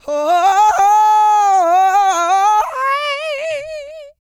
SING, Vocal Planet R&B & Dance; ILIO

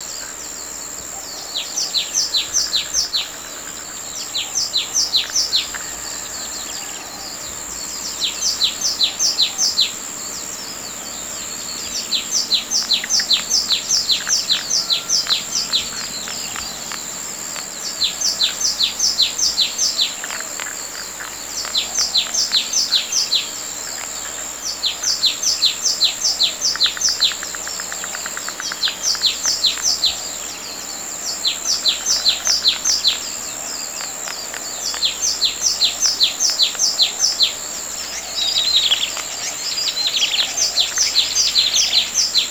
morning ambience; E-MU Producer Series Vol. 3 – Hollywood Sound Effects; Ambient Sounds

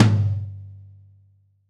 Brush Tom

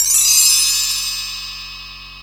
CHIMES, Ragga & Dub Reggae; PERCS KIT; USB Soundscan vol.27; AKAI

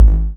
KICK L; USB Soundscan vol.20 - Fresh Disco House I

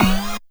SYNTH, Drum & Bass, 150 BPM; Ueberschall; Drum & Bass Resonance

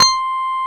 Korg CX3, C 1

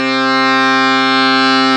Accordians, Euro. Accord; Club-50 - Foundations Roland

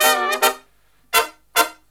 Brass Riffs; USB Soundscan vol.29; AKAI

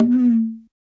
Fast Conga Bend; Sonic Foundry (Sony Creative Software); Joe Vitale Latin Percussion

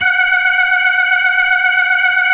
F#5F, B3, Vintage Keyboards

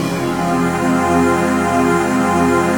ATMO PAD, Dance Mega Jungle Rave; Best Service

